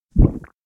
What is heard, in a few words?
push onigiri; anemoi